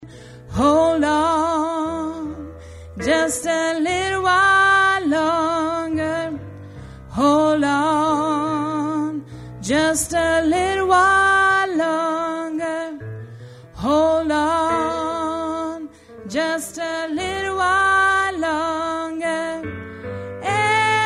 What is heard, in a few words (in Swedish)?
sopran